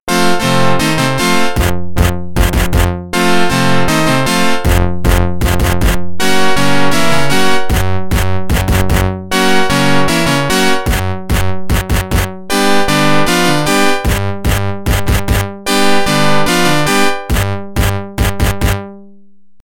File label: Organ; NHL 94